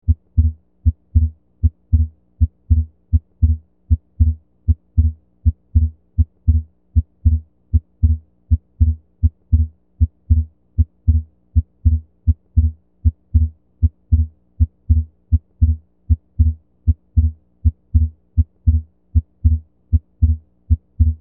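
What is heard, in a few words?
Pulmonary, Split S2 Persistent, Supine, Diaphragm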